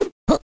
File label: symbol pass; jingle spin